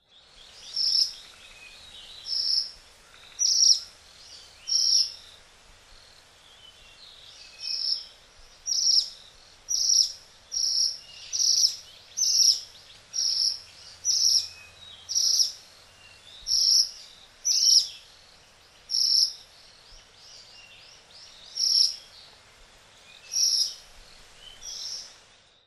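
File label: Ryukyu minivet, okinawa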